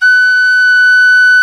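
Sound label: penny whistle, World Winds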